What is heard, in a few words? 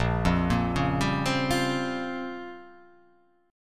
Bb Minor 6th Add 9th Bb down; arpeggiated; JGuitar